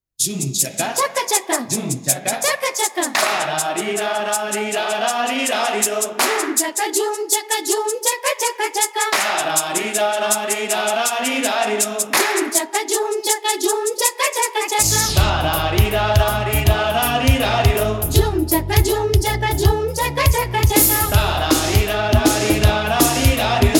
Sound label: Telugu sample